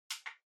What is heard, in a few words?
Portable MD (Far Button)